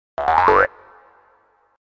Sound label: Collect Blue Egg; Banjo-Tooie